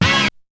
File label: Hit; ZERO G - Datafile DISC 1